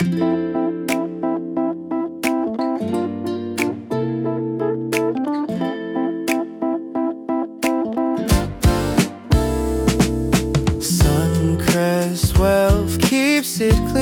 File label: Jingle